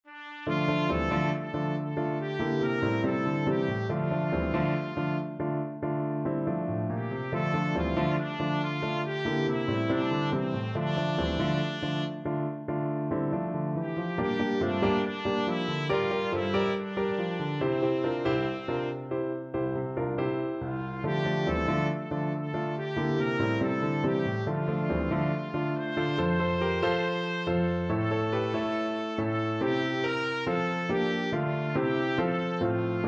rock trumpet

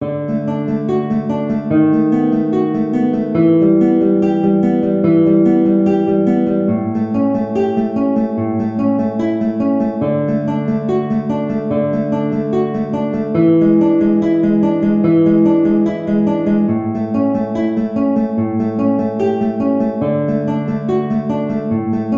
Prelude In D, guitar

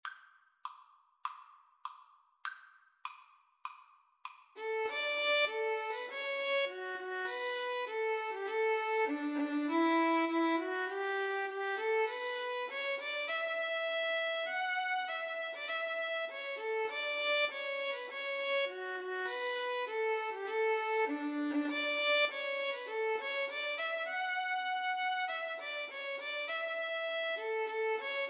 national anthem russia violin

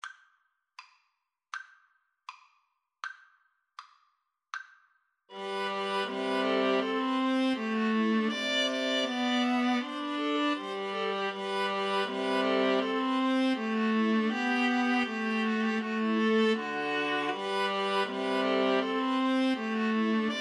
Gavotte Cello Suite 6, Viola Trio; Bach

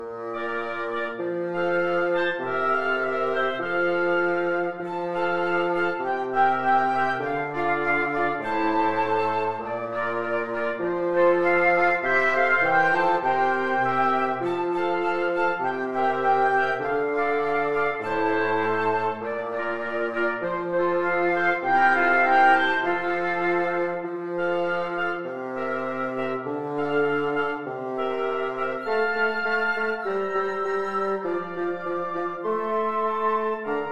old st nic wind quartet

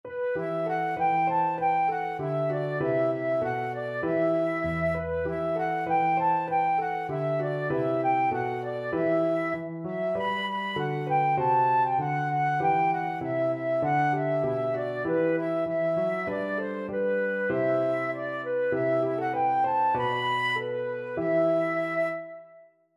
carol flute

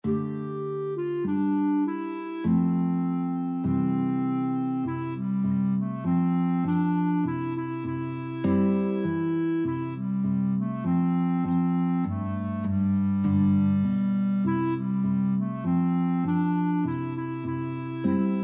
clarinet guitar duet; nobody knows the trouble

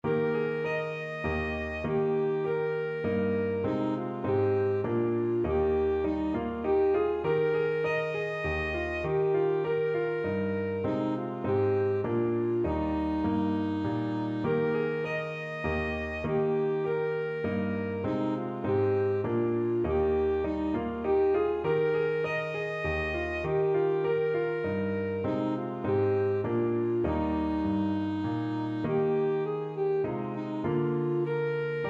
minuet g ASAX, saxophone

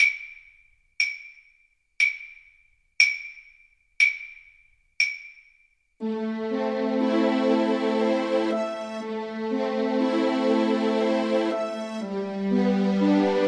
Oboe

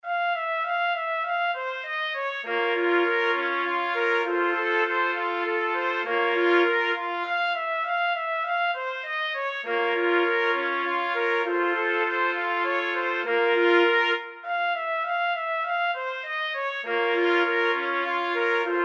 trumpet quartet

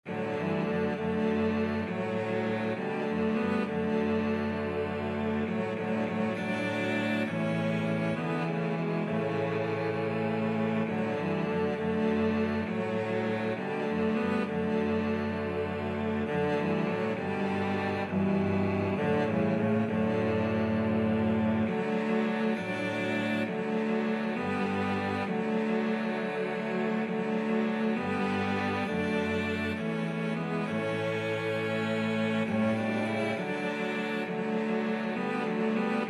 cello quartet

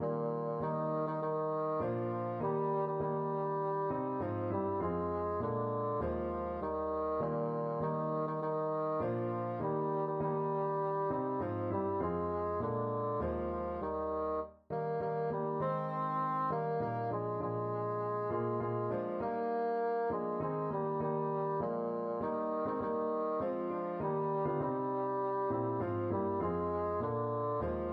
christmas tree, bassoon

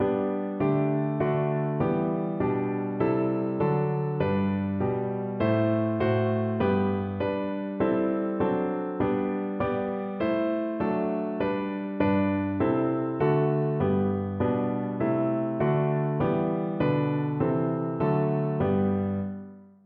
canon, piano

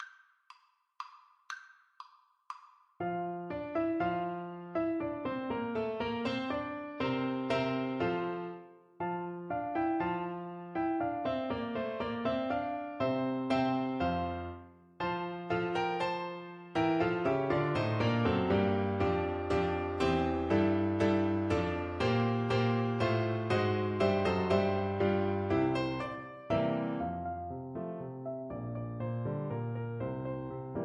flute

